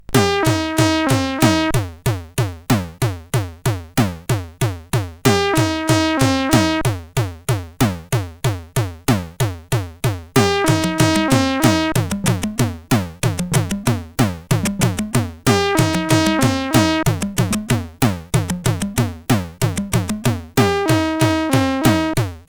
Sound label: Indian Invasion, CSG8580R5